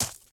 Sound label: bolt gravel, material; STALKER; IWP